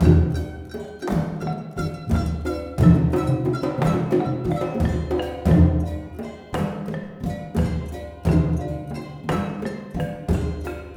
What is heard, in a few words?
MALAY L, Heart of Asia